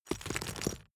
stone drop small stones hard

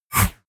whoosh metallic synth short

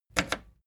record player play click fast

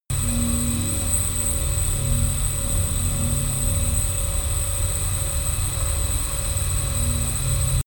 sci-fi plasma cannon move loop

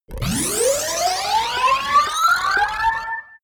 battle station alarm

